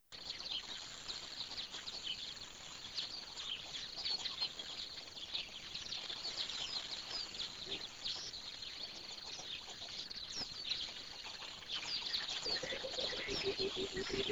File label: Morning Birds Coucals